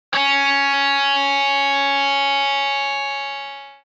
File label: guitar d